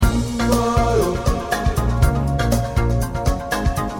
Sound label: ringtone